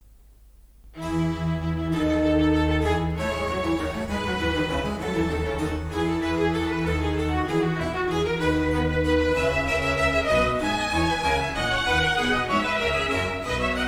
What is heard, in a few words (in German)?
Konzert F Dur Vivace